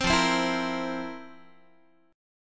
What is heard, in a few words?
F Major 7th Suspended 4th, Guitar; strummed